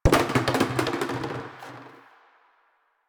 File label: Plastic Hit; Warframe; Ambience; Nineteen Ninety Nine